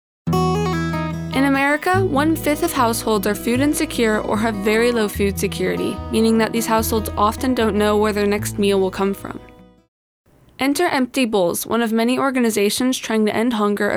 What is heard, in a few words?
BEAT